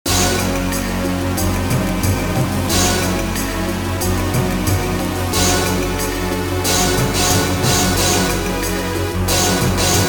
game complete

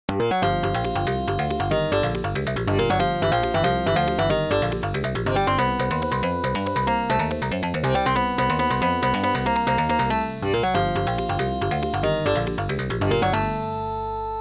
start bgm; rolling thunder

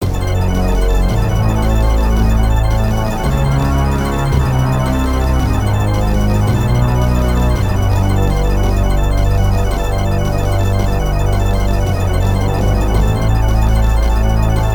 immolation death bgm; interceptor 1

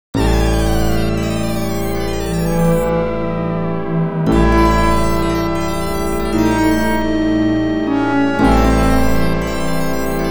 break out

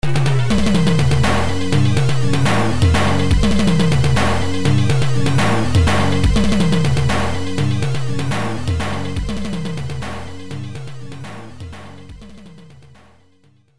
loop; the temple gate

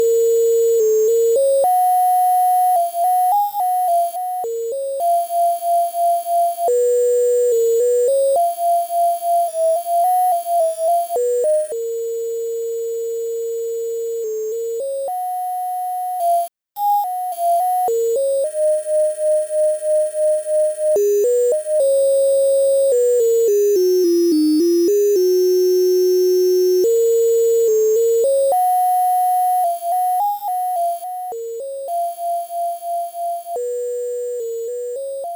in game bgm; dux

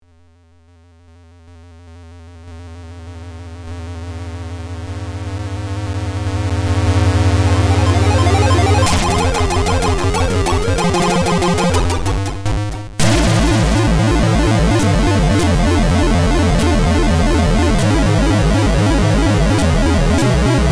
end sequence ignition; orion prime

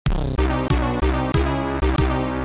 game over; apidya

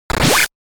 cut dragon; dragon buster

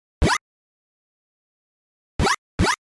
jump

